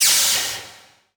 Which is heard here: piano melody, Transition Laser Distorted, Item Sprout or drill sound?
Transition Laser Distorted